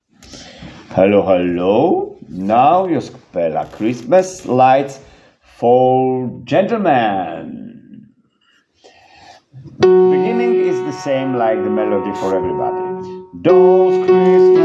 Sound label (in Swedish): tenor; Christmas light tenor